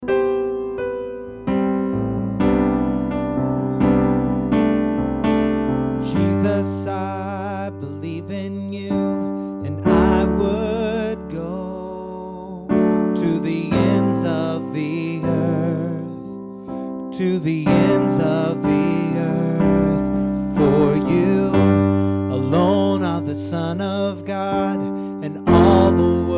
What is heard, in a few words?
vocal Alto, Vocal track